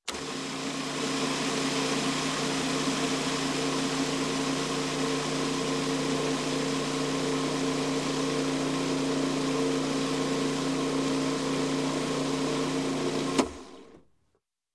Food Processor Start, Run, Stop, Dry Ingredient; Sound Ideas 2000 Series

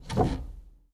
Foosball Goalie Spin

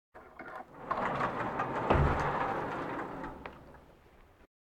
Animal effects snorts grunts farm ambience

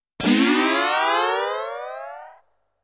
Explosion Program Effect

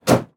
Semi Truck Mac; Blastwave

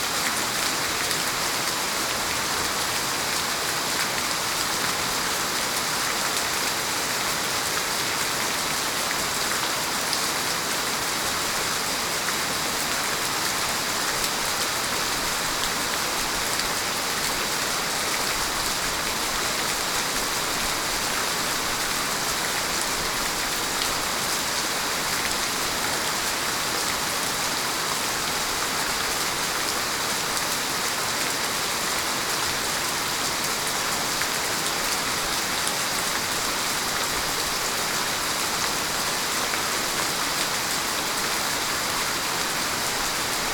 Rain downpour; boom library; Thunder And Rain

